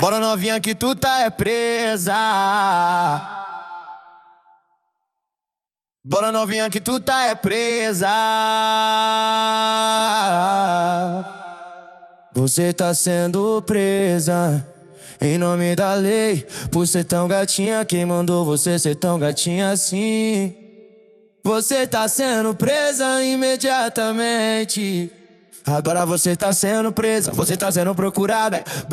Vocals

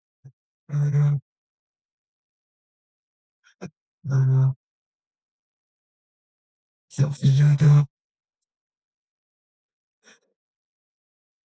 Vocals

